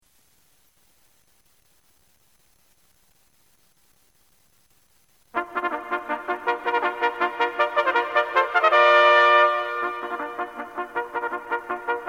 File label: fanfare, de viento; purcell